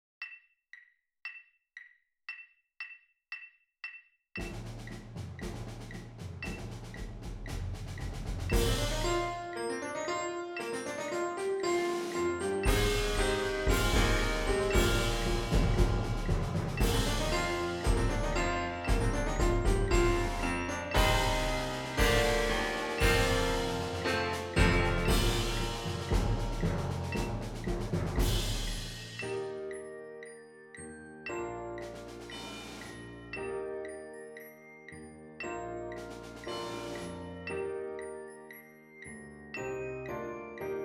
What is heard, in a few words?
Click Track Percussion and Piano